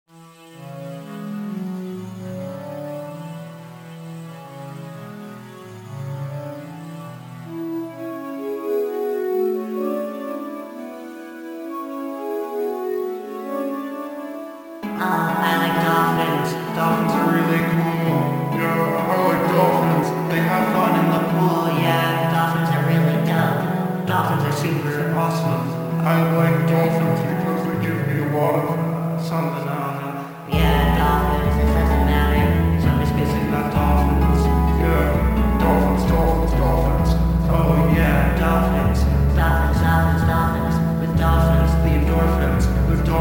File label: Underwater Expedition interlude; bibarel ii